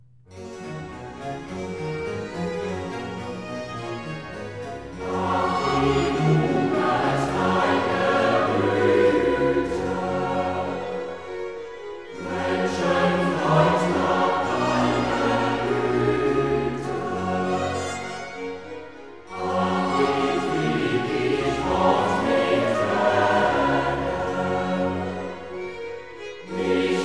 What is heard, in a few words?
Coro; Handel Masterworks